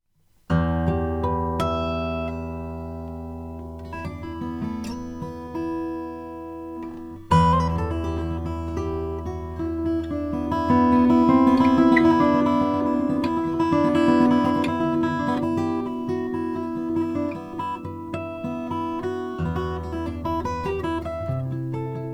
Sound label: kostal omc celebes ebony